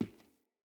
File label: Boot Walk Wood, Footsteps; Characters; Enemy; Boots; Sinecure; Melee Grunt